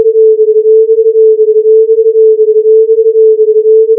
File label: crunch